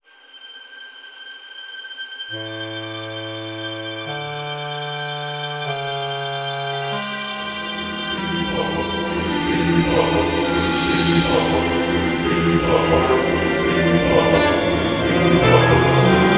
Something Wrong, Tension

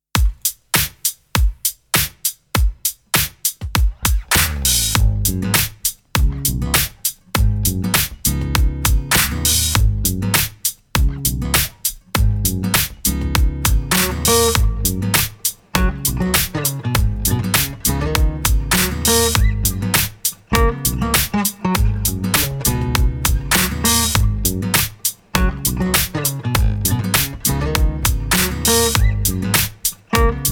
unrest

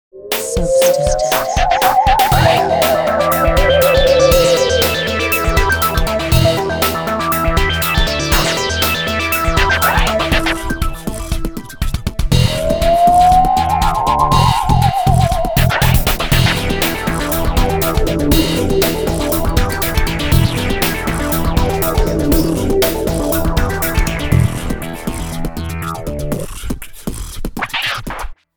Electronic, EDM; 리듬

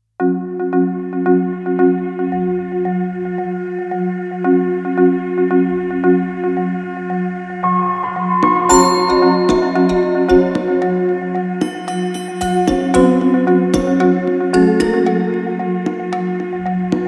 Marimba; 다큐 BG

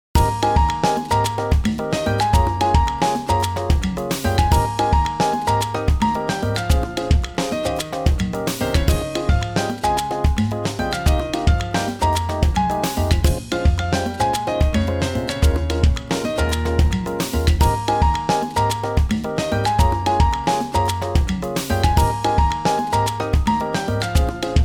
LatinJazz

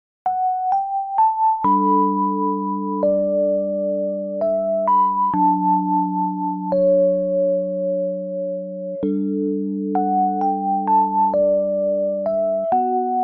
New age; 잔잔

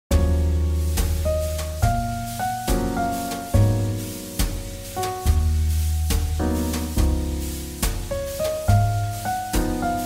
JazzBallad; 잔잔

